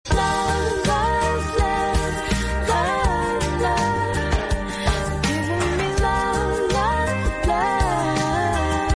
romantic english song tone ringtone

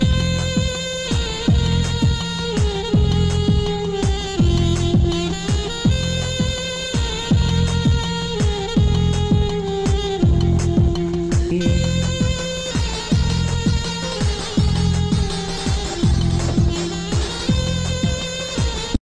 kannada love music ringtone

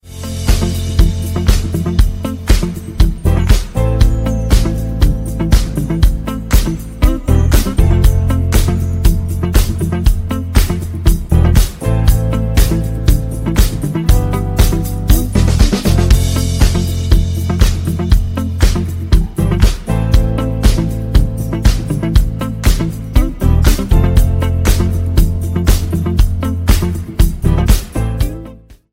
life with instruments ringtone; english ringtones

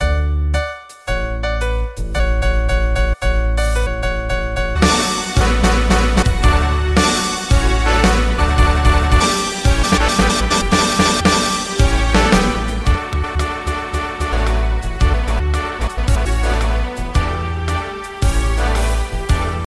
samsung core prime ringtone

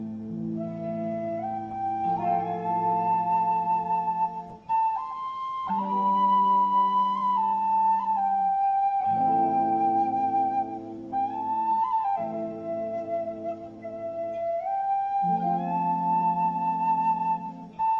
tin whistle guitar ringtone

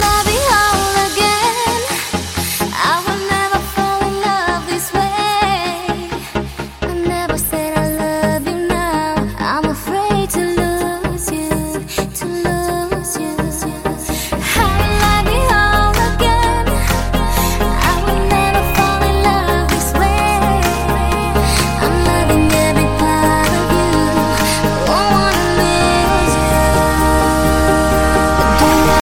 cool song ringtone

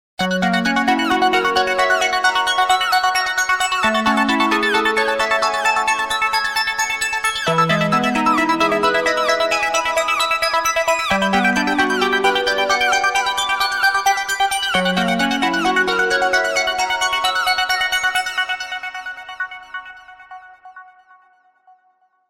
electronic ringtone

